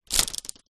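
household medicine paracetamol foil strip flick movement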